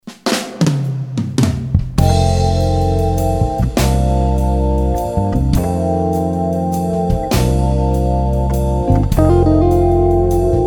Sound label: ensemble; Zone 8 Recording